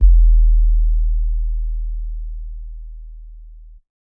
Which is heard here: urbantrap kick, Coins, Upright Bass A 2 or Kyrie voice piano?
urbantrap kick